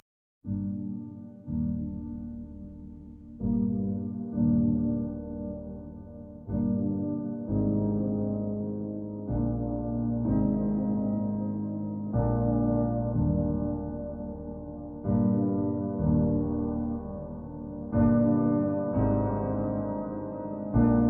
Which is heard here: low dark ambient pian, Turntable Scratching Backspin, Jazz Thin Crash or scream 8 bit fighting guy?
low dark ambient pian